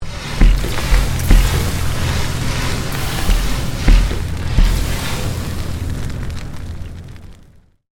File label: Created Magic Spell Meteor Shower